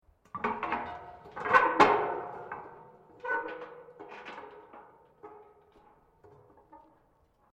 METAL CREAK LARGE; Materials

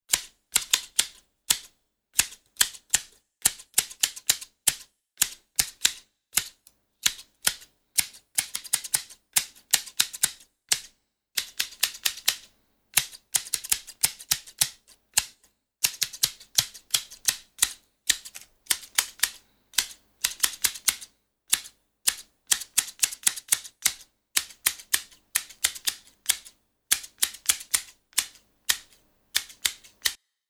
Snipping sound of garden sheers; Hand Tools

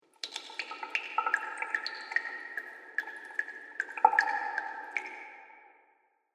Water Fantasy Cave Drips

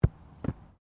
SOCCER BALL BOUNCE CATCH GRASS; Soccer ball sounds; Sports

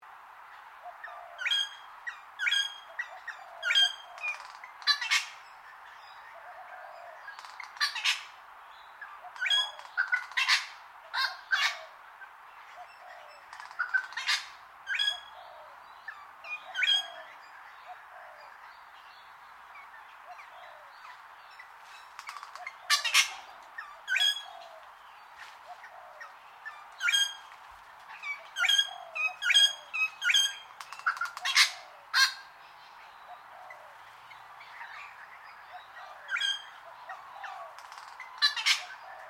Bird Wattle Bird Call Long, Australian birds; Animals

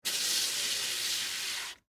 Bicycle Wheel, Stop, Schwinn, Vintage; Sports; Loop; Bicycles; BlastWave

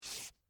Footsteps, Boots, Scrape, Concrete; Human

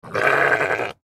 Sheep Baa; Animal; Blastwave

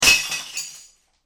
Bottle Smash Heavy; Smashes; Objects

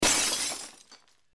Glass Break Pane; Pane Of Glass Breaks , High Pitched

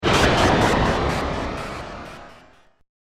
Dark Wave Impact; Miscellaneous Impact Sound Effects

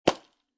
Foley, Aerosol Can Cap Remove; Lid; Lids; Objects

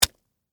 Impact Celery, Texture, Impact, Celery, Vegetable; Miscellaneous Impact; BlastWave

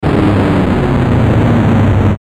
Descend Slow Down Static Synth; Descending Sound Effects; Blastwave

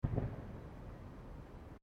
Fireworks Single Firecracker; Backgrounds; Crafted Signals

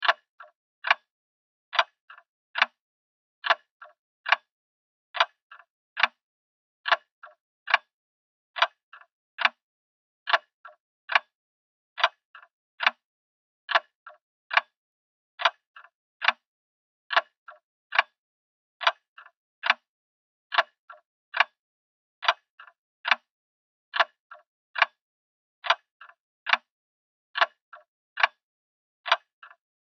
Horror Grandfather Clock Ticking Tick Tock; Scary Soundscape

